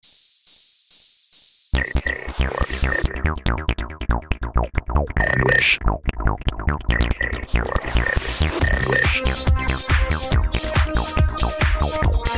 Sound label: Magical Music